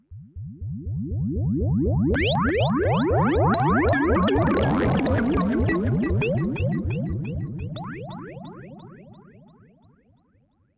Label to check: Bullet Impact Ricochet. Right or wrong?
Wrong